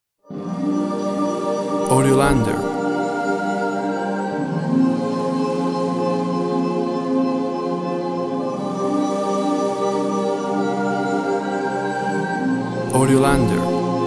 Ocean Cry

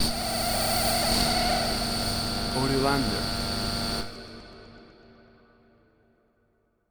Alien Scream High

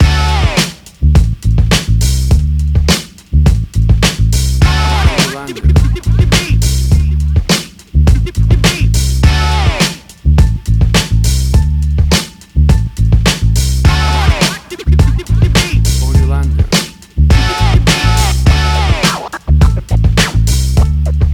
90s Hip Hop; Rosecrans Avenue

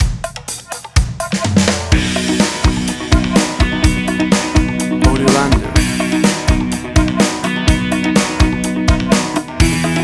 self confident stinger

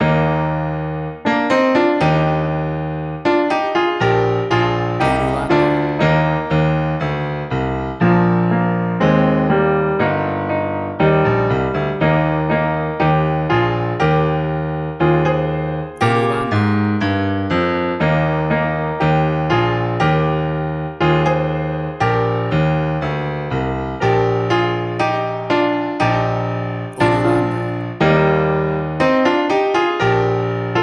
Afganhistan National Anthem Piano

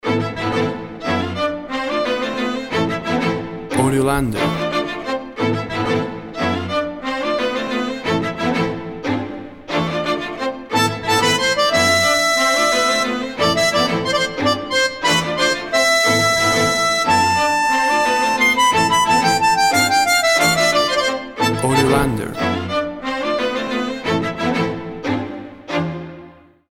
Desert Tango

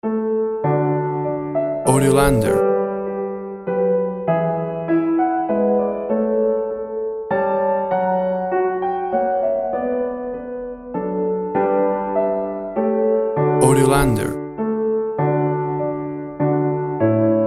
We Wish You A Merry Christmas Soft Piano

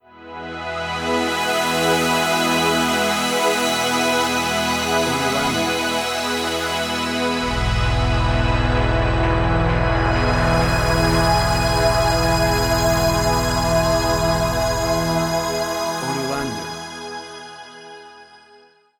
Basic Pad Atmosphere

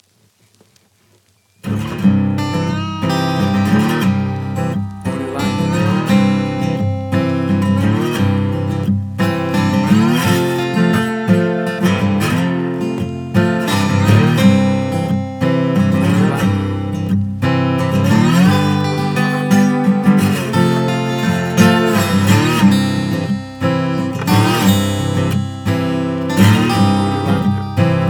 Backcountry Blues

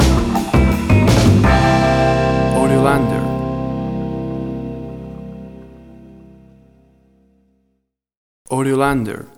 Friday Night in the 50s Stinger; The sound of Friday night in the 1950’s.

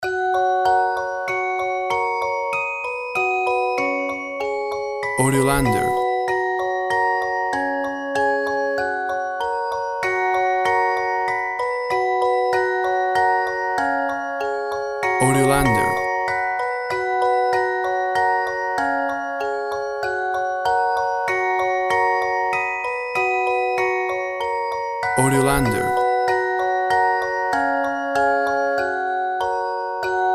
Twinkle Twinkle Little Star Music Box

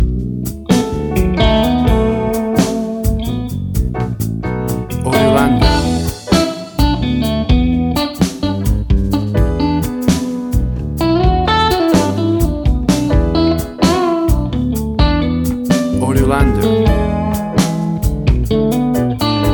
Bossa Blues

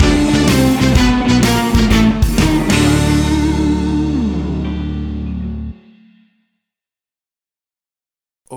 Blues Boy Stinger

